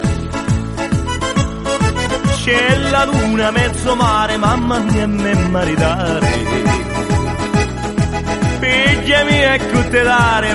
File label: italian music